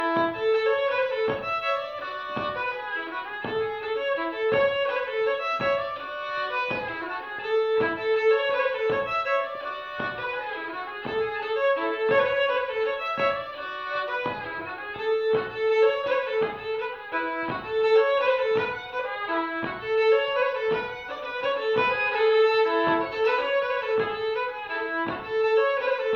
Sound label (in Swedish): 5årsvalsen